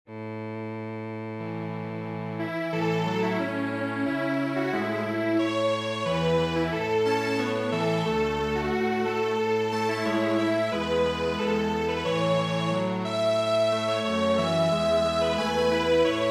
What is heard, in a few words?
Strings Quartet